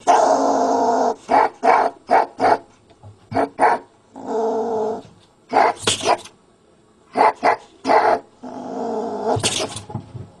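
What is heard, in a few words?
sable voice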